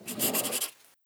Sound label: drawing move